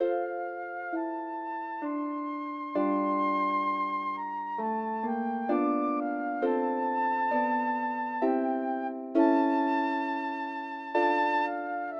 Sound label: Flute and Harp; Wedding Music for Flute and Harp Vol 2; intermezzo from cav